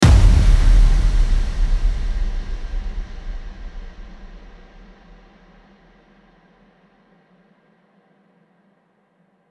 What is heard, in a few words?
Impact, transition